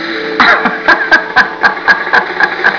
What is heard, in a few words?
ahahaha